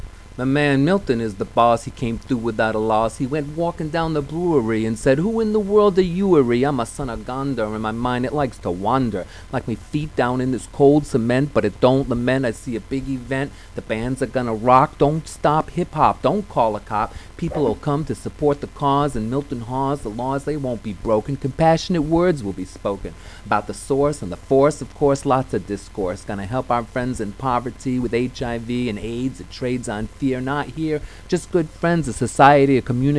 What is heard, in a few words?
rap